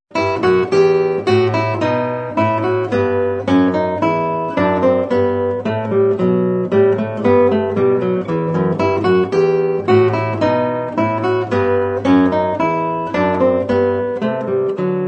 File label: bourree; johann sebastian bach